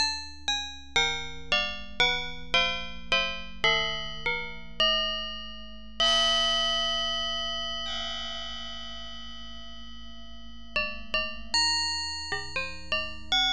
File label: cloche